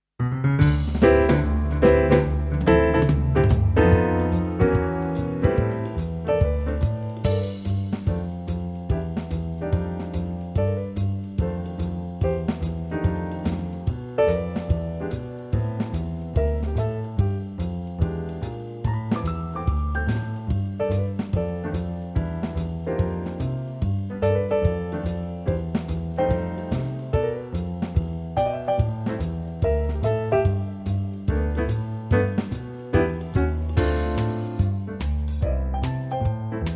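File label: G, backing track; key - G - vocal range - F# to B; Fab Livetrak arrangement of this seasonal ditty!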